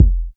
tight urban bass drum single shot F key, Royality free kick sound